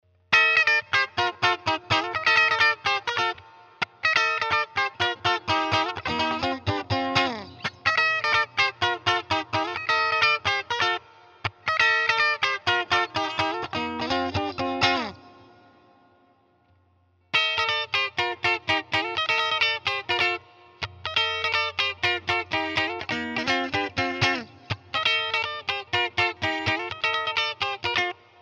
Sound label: Ibanez S Prestige Clean, guitars; s6570q prestige